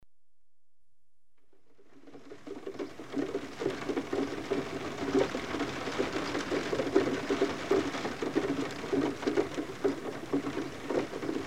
Rain on window with water dripping off roof (interior acoustic); Weather; The BBC Sound Effects Library